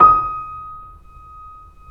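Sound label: keys, YC7 piano pp; Roland L-CD701; pp YC7 St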